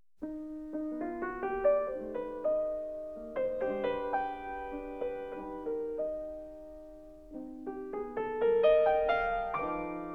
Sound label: Feuillet d'album for piano in E flat major; Scriabin